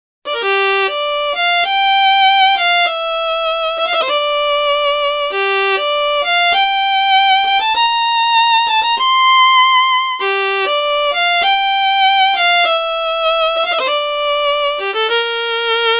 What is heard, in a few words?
violin dry